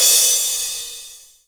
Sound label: soft hit finish